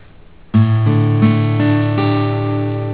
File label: chd dsus, guitar